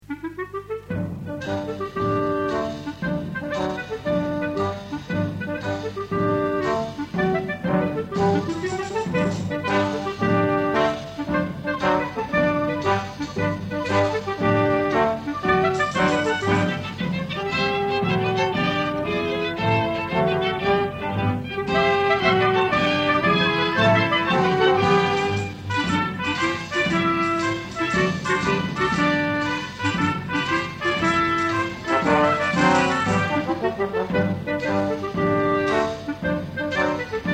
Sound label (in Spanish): himno nacional, republica española (himno de riego); himnos; españa